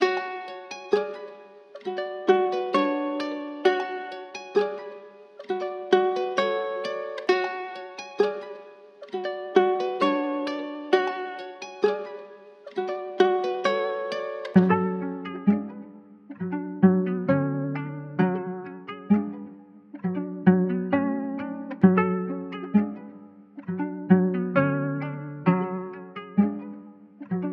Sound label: 132 BPM F# Min Ukulele; Cymatics; Destiny Gold Expansion; Afterlife